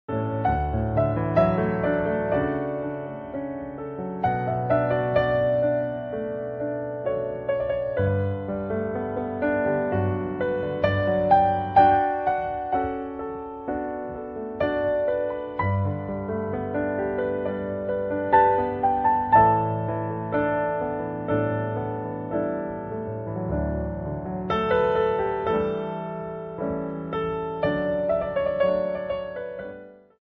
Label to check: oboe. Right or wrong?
Right